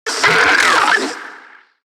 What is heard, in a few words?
Ranged Pain; Necramech; Battle Barks